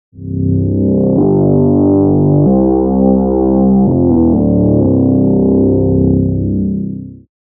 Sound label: Loop Ambient Feelings